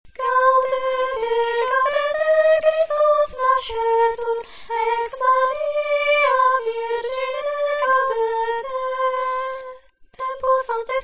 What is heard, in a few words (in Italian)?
Gaudete Soprani; Gloria in excelsis